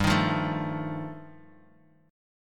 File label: G 7th Suspended 2nd Sharp 5th G, Bass Standard; strummed; JGuitar